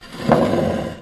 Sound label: pub wooden bar stool scrape on tile floor; field reports